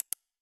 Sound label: Minimalist